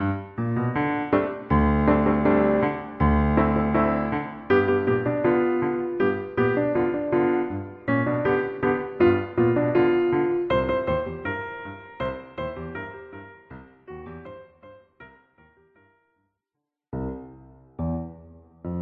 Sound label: combo, Jazz, blues, and rock